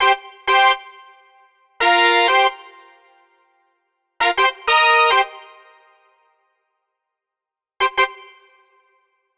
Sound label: Brass; духовые